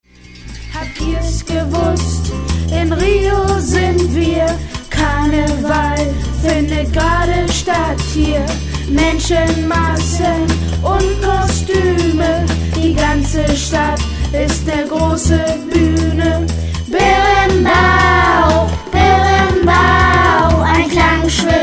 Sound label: Berimbao